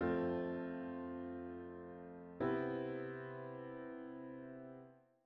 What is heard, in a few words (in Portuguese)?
Estudo de Harmonia acorde de sexta cadência plagal